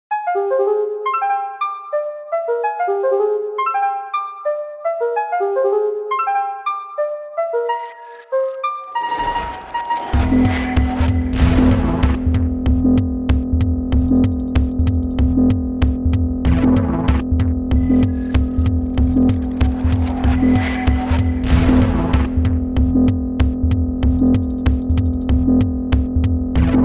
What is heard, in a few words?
clockwork